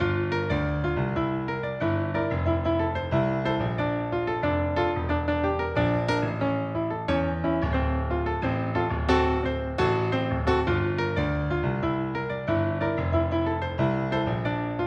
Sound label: piano latin